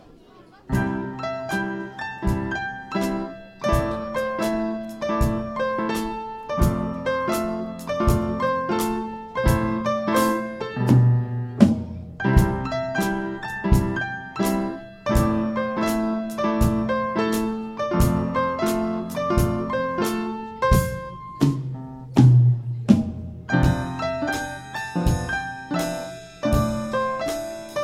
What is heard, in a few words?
Piano song